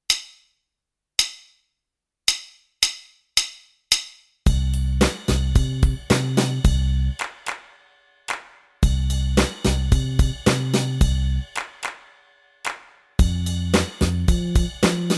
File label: Bucket of Blues Track